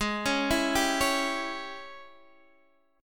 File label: Ab Major 7th Suspended 4th Sharp 5th, Guitar, Open D; x,11,11,10,10,11